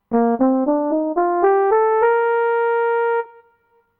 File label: trombone short